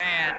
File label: count down